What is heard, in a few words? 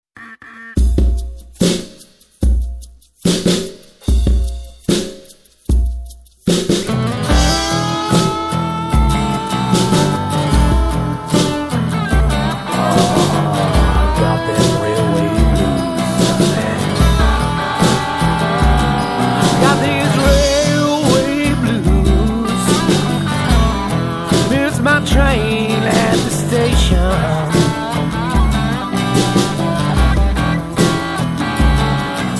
railway blues